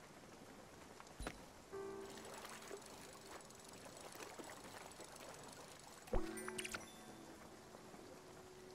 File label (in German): fischen; spiritfarer